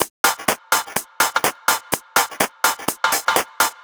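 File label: Electro Haus No bass drum, Drum Loops 125bpm; Nu Dance Electro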